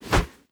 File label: torch attack; Rust